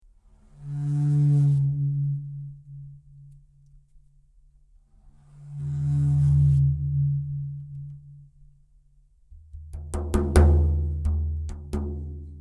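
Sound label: frame drum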